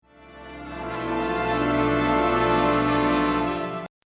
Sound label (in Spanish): Bajo